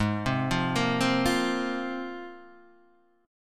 Ab 6th Add 9th down Guitar Standard; arpeggiated; JGuitar

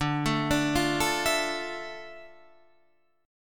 D Minor Add 9th; Dmadd9; arpeggiated; JGuitar

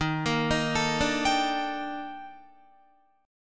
Eb Major Sharp 11th Eb Guitar; EbM#11; arpeggiated; 11,13,13,14,14,14; JGuitar

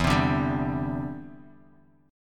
E Minor 7th Sharp 5th E; strummed

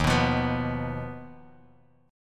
D sharp Suspended 2nd Sharp 5th, Guitar, Open G; 1,4,3,4,x,x; chordshape

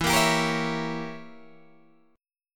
E Diminished 7th E, Guitar Open G

